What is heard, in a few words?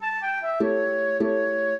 trio, flute harp; Mozart